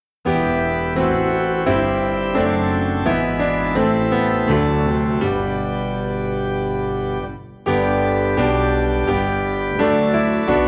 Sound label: Piano and Organ Low in G; A Mighty Fortress Is Our God